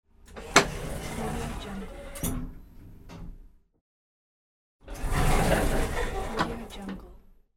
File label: elevator door opening and closing